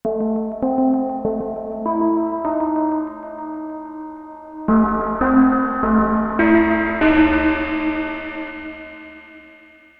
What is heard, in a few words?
novation peak atmo lead; novation peak test